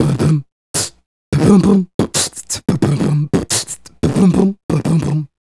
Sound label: Beat Box, Vocal; Sonic Foundry (Sony Creative Software); Vocal Toolbox